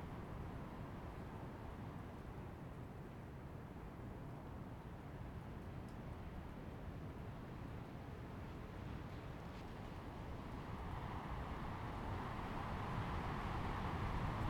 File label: ambient Outside Windy Night; Zomboid 41.78.16; Project Zomboid